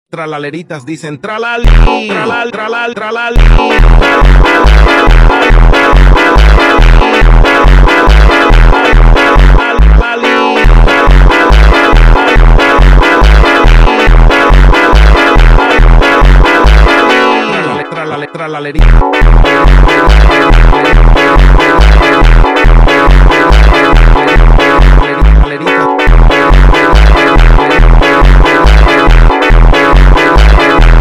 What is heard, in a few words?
Funk Meowsynth